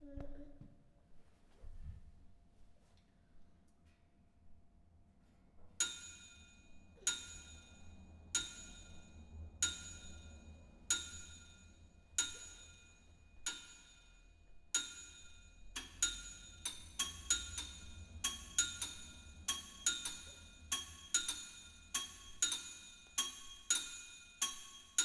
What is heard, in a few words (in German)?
Klanginstallation Minimalmusic